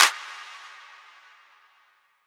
Claps

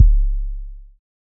Not Nice 808's

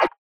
Perc

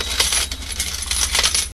Money Bag; Tripp3 Xool

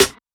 snare hit

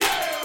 Chant, Vox

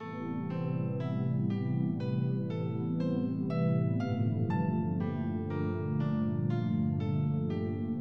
Piano Arp

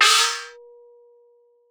C, Crashes & Cymbals

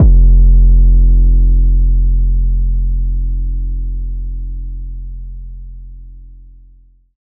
808 F; Cymatics

